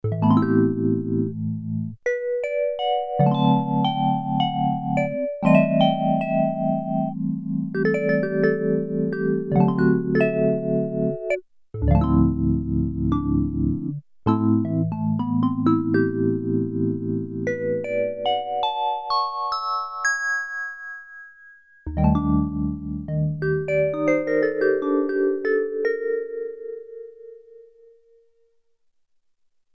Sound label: VIBES, Oberheim OB-8; Factory Patches